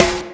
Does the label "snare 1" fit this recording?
Yes